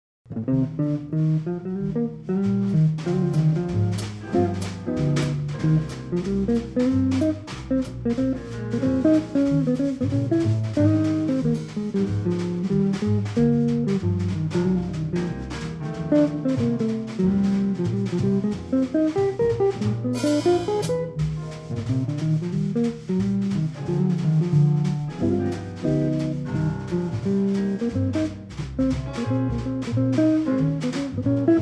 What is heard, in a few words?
quartet